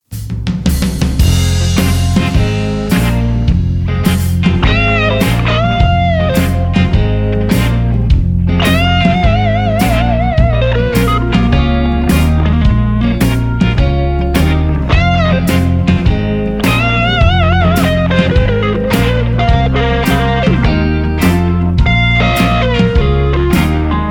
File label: Blues